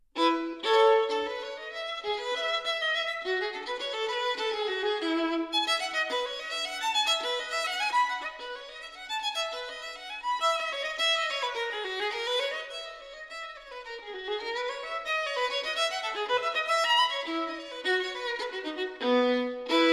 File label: violin partita in E major, bourrée; violin partita no 3; bwv 1006